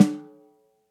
SNARE stick ghost note

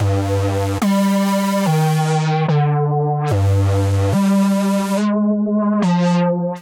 Bass G Minor 145 bpm; MIDI Clips; Ableton; Factory Packs; Skitter and Step; Rage

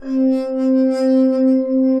Big Glass Sustained, Ethereal Pads; Multisampled; Instruments; Ableton; Mood Reel

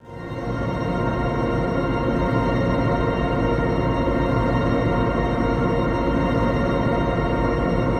The End Pad, Synth; The End Pad 4; The Forge by Hecq; Ableton; Factory Packs

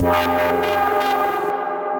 Pad Static; Ableton; Factory Packs; Punch and Tilt

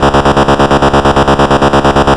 Pitfall Trem C#1, Glitch Toys LoFi Samples; Ableton Foundation FreePacks

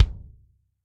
KICK Tight Attack open plastic

